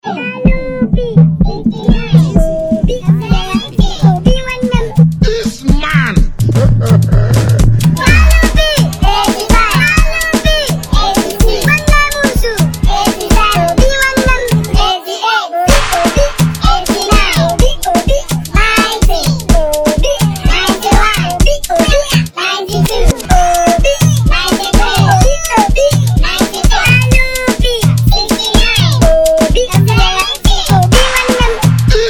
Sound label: beat